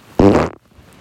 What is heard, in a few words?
crunk fart